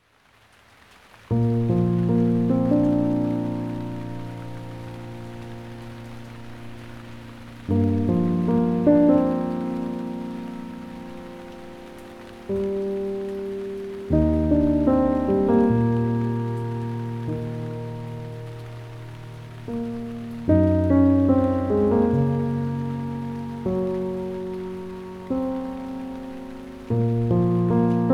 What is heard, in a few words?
Light Sleep (With Rain), Calming Piano Music with Rain